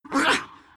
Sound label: pain; Dkseer